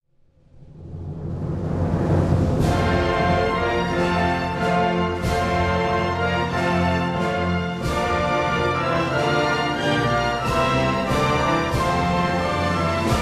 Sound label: armenian anthem